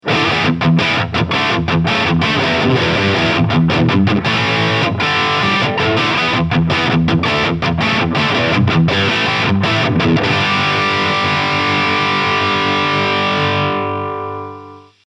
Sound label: Solar Guitars X1.6 lead; Canibalismo